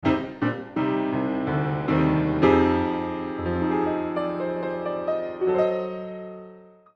Piano Spaced Pair Cardioid; Recording Mistakes

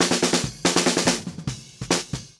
Multimic Facets Snare Mix No Flip

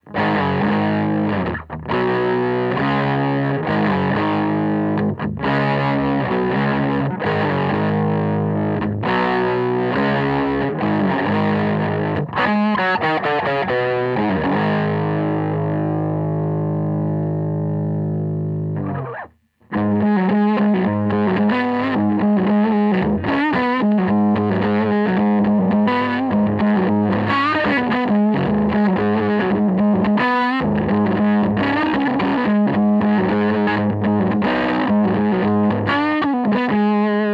Phase EQ Mics Kickball

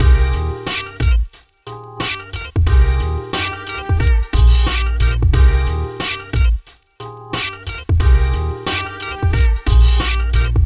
Drum Loops Comb Mix; Mixing Secrets

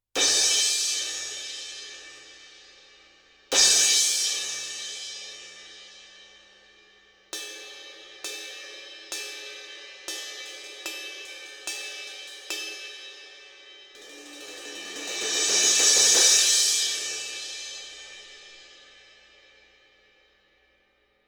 Cymb Crash; 120 Degrees From Vertical

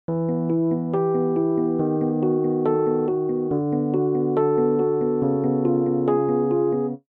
Articulation

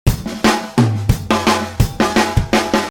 Drums Tempo; Melodyne

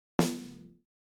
Snare Comp Fast Att Slow Rel

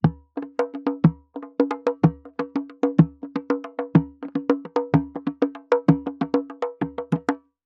Hand Drum One Mic; Manufacturer Audio

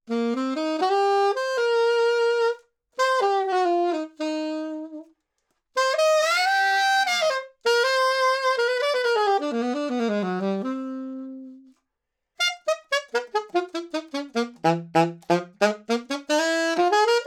Sax Alto 50cm C Low

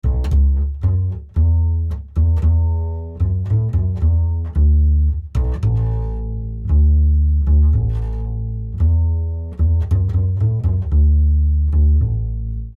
Resonance Ac Bass

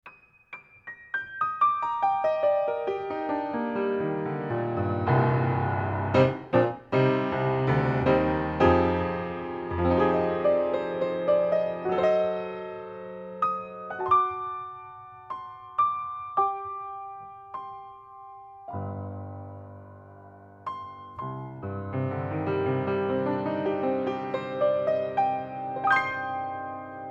Piano, 30cm Omni Pair To Card

